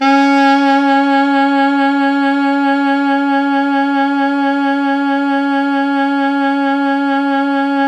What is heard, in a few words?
Pop Brass, C#3 -R; Best Service ProSamples vol.19; AKAI